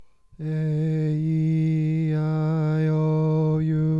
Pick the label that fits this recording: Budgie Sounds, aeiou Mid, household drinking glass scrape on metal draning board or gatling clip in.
aeiou Mid